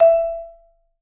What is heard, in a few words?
Vibes; Sampleheads - Dave Samuels Marimba & Vibes